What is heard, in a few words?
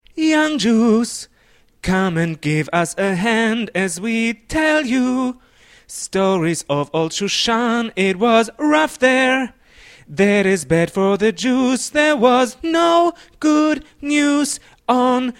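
tenor, choir music; BCC choir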